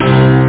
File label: piano bass